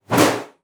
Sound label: BOW PERC, BASS FINGER; Xsample VOL-5